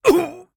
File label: Damage; Striker Jones